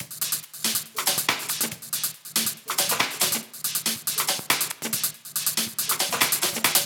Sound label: drum Loop HH 140BPM